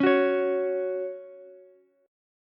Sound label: Gb 5th Gb down Ukulele; Gb5 strummed; JGuitar